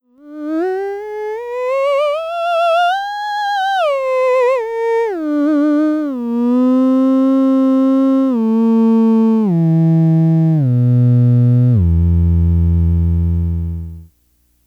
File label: theremin